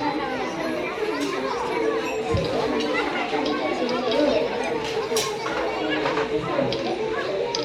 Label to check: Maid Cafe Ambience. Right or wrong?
Right